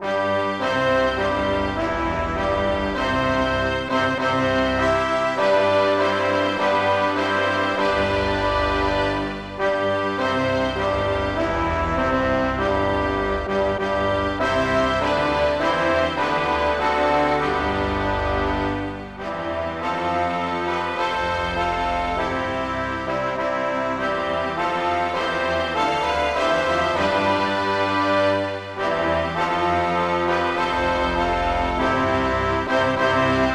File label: brass; Advance Australia Fair